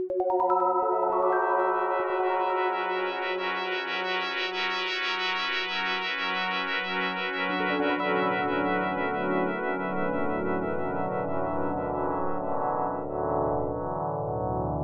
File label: xanadu, Csound; xanadu.csd from Csound